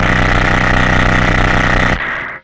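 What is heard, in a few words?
RUMBLE right, Hard & Loud Techno; USB Soundscan vol.01; AKAI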